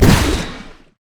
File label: syndra w launch; LoL